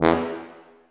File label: TUBA, Solo Brass, Tuba stacc